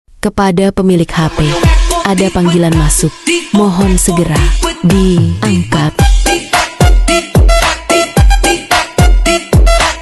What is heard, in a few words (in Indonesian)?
nada dj angkat telepon; nada dering